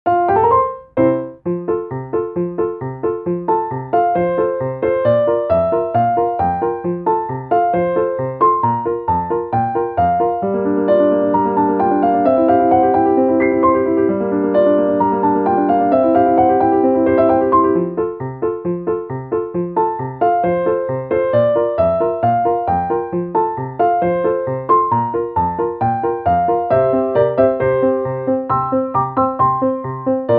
piano; 跳ねて弾んで; 明るい 軽やか

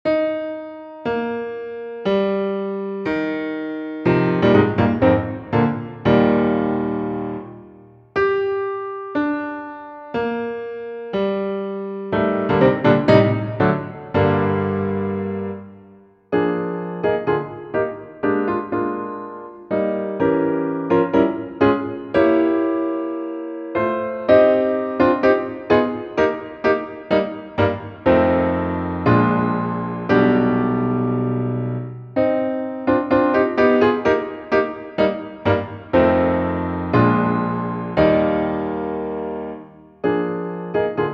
piano; grieg

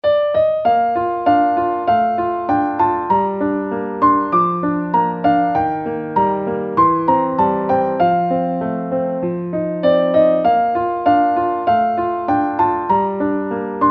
piano

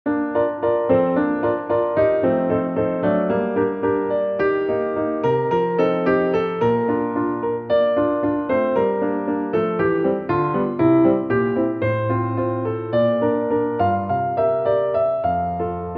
piano; schumann

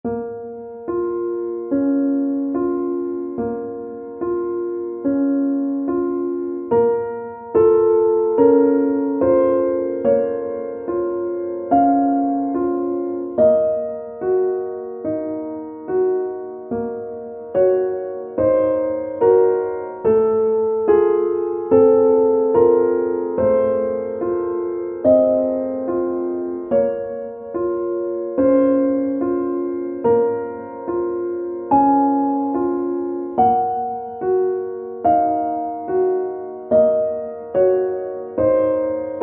piano

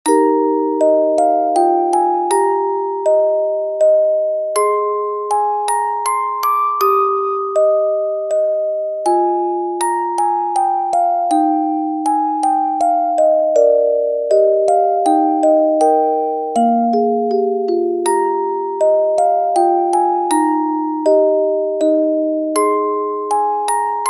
menuet g dur; bach

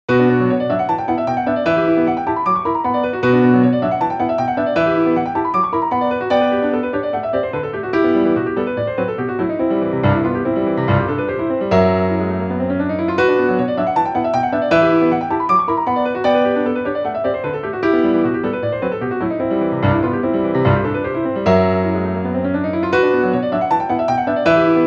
piano; schumann; 子供の情景 第３曲「鬼ごっこ」; シューマン

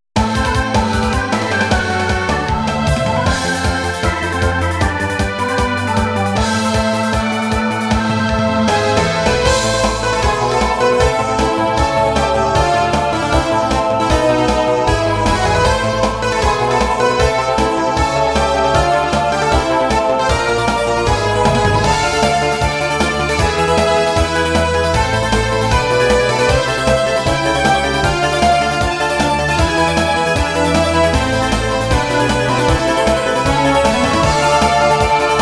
battle

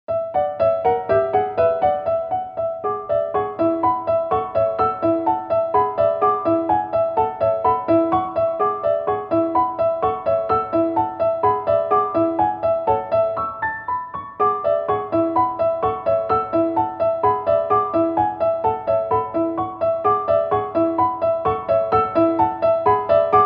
musicbox piano; lyadov

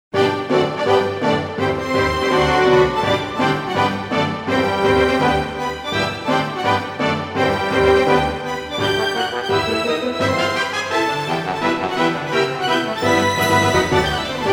symphony orchestra

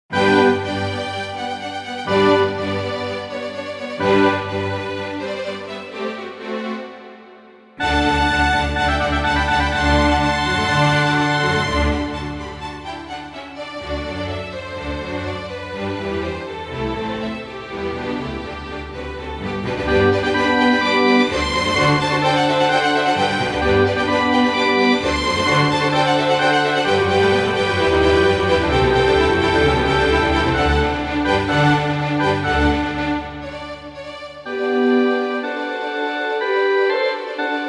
violinconcerto3 1; classic; mozart

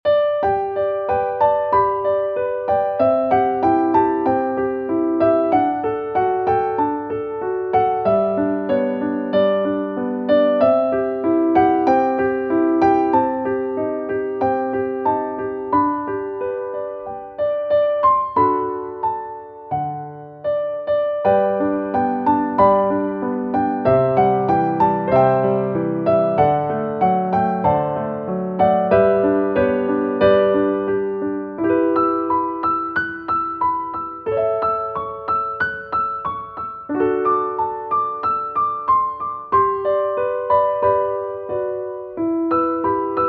piano

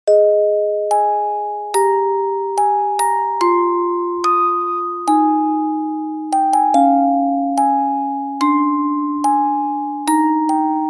orgel; 癒し オルゴール

